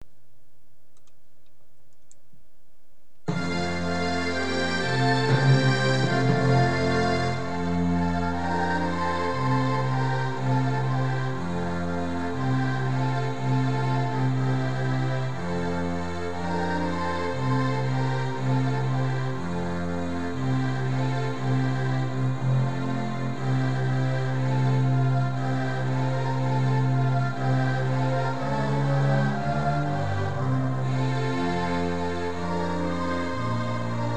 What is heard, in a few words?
Ode To Joy accomp; Grade 10 Class Exam Solos; Xtras Classical Favorites